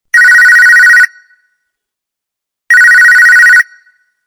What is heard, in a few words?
Phone; Coolpad; 5010